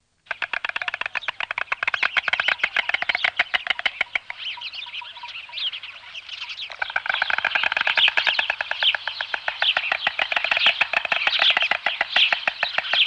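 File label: White stork; Madarak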